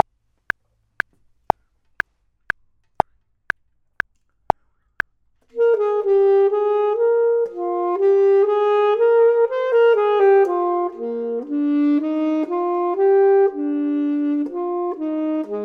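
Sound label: top, Saxophone and Clarinet; voxman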